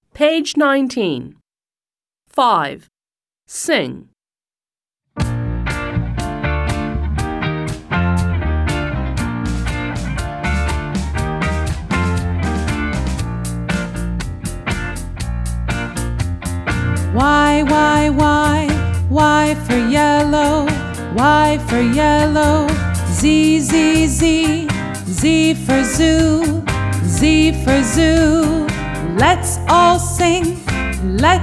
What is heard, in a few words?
song Y to Z; American; My alphabet book; Smart Book 1